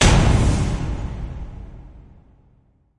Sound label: Hit Big Hit; Brand X Music; Brand X Music Catalogue - Editor's Essentials